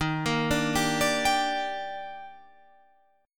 Eb Major 7th, Guitar Drop D

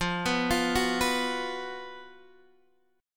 F sharp Major 7th Suspended 4th

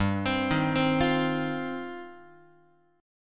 G 7th Suspended 4th Sharp 5th, Guitar; G7sus4#5 arpeggiated

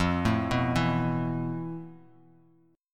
F Major 7th Flat 5th Bass Standard; arpeggiated; JGuitar

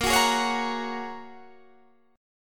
Ab 7th Suspended 2nd Suspended 4th, chord

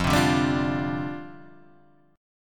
F 6th Suspended 2nd F; F6sus2; strummed

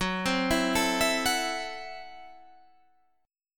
A Minor 6th F sharp

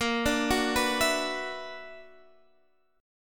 B-Minor Major 11th

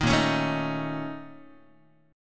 A sharp Minor Major 7th Double Flat 5th, Guitar; strummed; 6,6,4,7,7,x; 4A,4E,5A,5D,5G#,6C#; JGuitar

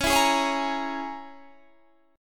E 6th Flat 5th C sharp

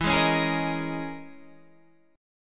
C sharp Minor 6th Add 9th E

